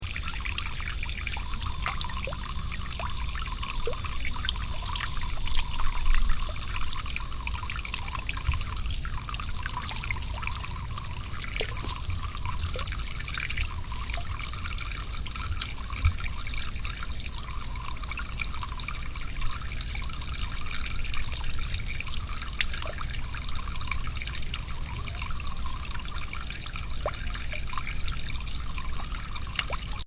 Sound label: fountain